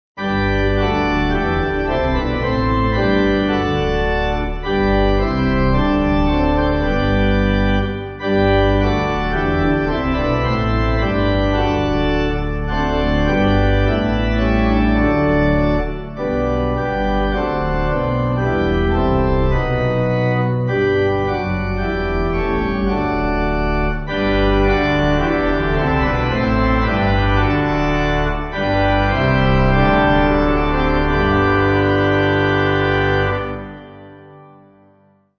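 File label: Pipe Organ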